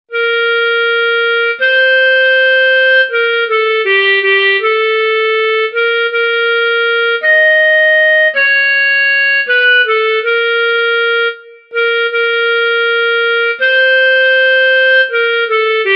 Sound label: steady beats vocals and instrumentals